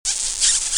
Bisous

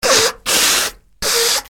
Mouchage; bruitage

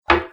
Baton sur bois

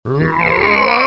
Grrrrr

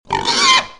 Cochon, Animaux; bruitage

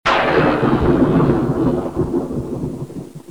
Grondement orage; bruitage; Climat

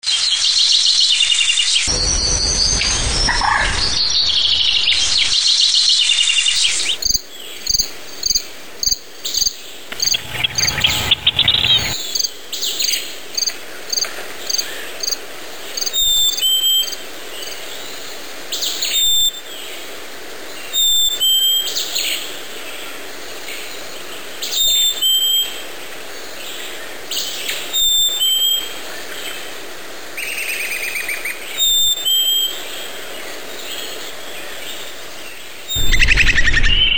Ambiance nature; bruitage

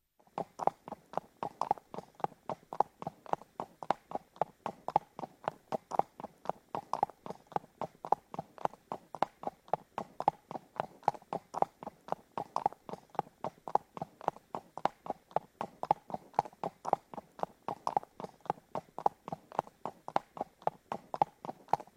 galops de chevaux; Ombres du passé

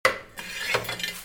Bruitage sur etabli; Outillages

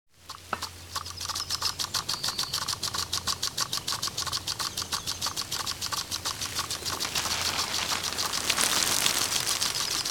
Arroseur pelouse; bruitage; Liquides

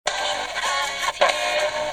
Tuner; bruitage; Electro-menager

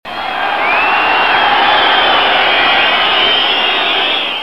Sifflet supporter de foot

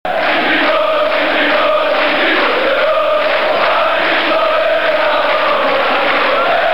Supporter; bruitage

